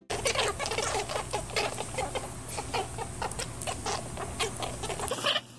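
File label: krolchata, rabbits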